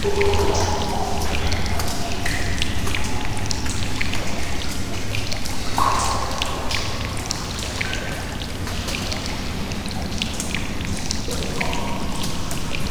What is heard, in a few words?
cave, ambiances